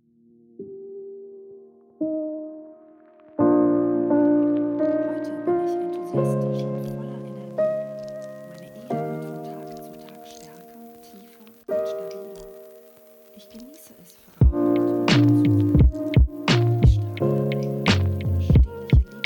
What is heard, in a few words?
Dreamy